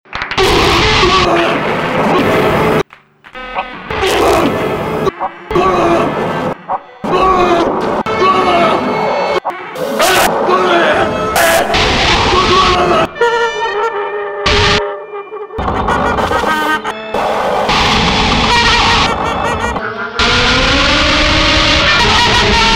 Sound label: HARSH NOISE